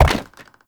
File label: Step Wood; Warframe; Uriel; Movement; Steps